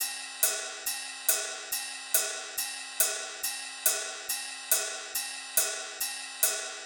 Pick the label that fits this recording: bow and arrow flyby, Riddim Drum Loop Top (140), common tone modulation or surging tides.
Riddim Drum Loop Top (140)